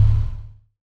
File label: stop, vehicles, lancer; sgmcars